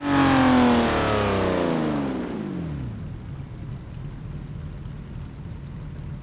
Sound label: throttle off, vehicles